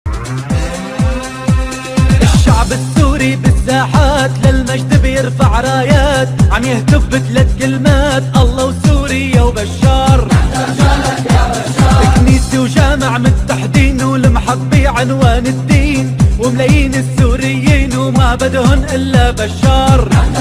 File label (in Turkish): davul zurna; arapca; suriye; arabi